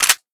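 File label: slide back, deagle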